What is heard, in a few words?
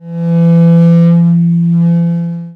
Didge toot